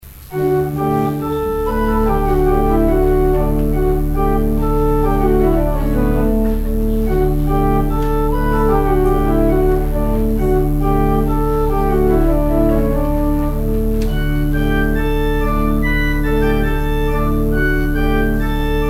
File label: gavotte en rondeau; Organ interlude; Gavotte en Rondeau (J.F. d'Andriau); St. Mungo's